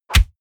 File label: punch oldschool